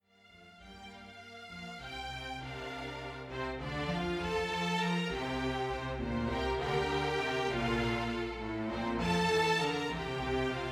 for String Orchestra

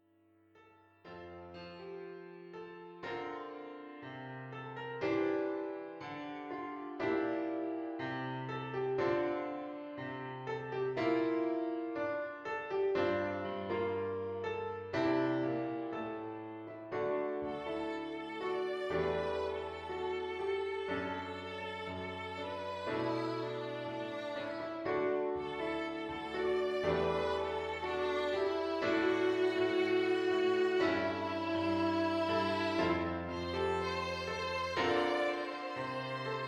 BALLAD FOR PIANO VIOLIN

